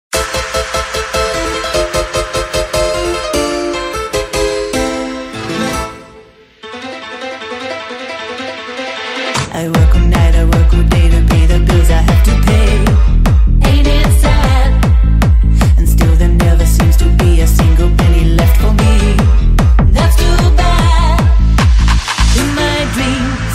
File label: Techno Remix